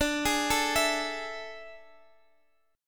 A Major 7th Suspended 4th D

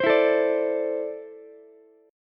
E 7th Suspended 4th Sharp 5th, Ukulele; E7sus4#5 strummed; JGuitar

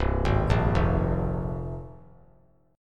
C 7th Suspended 4th Sharp 5th; C7sus4#5 arpeggiated

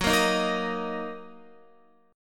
Fsharp 6th down Guitar; F#6; strummed

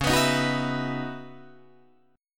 C sharp Minor 11th C sharp, Guitar Drop D

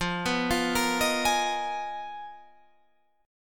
Ab Minor 6th Add 9th, F, guitar chord

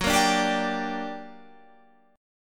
G Minor Major 7th, Gb, guitar chord

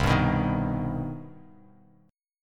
C Minor Major 7th down, Bass Standard, chord; strummed; JGuitar

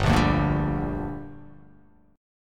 G Minor G down, chord

